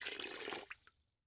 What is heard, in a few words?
Liquid Water Water Pour Plastic Cup Into Pool